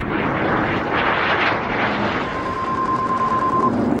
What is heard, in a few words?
windy beeps